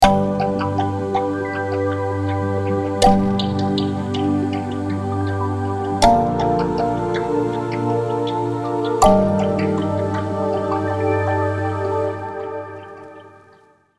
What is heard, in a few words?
Chords Ethnic; Unison; MIDI Wizard